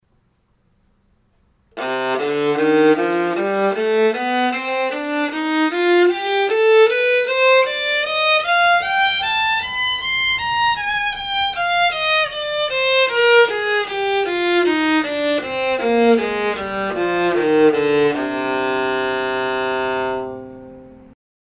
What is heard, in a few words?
Viola c minor